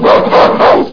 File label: gorilla